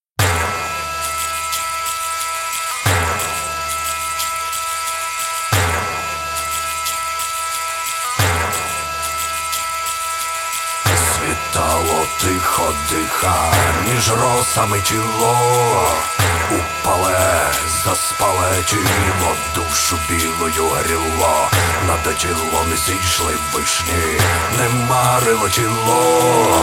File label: lira lyre